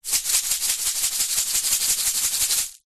food cake decorations hundreds thousands tube shake multiple